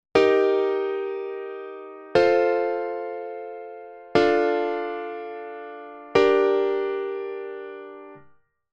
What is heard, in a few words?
chord